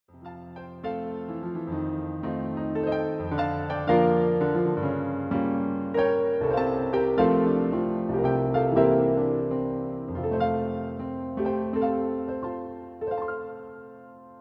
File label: piano roll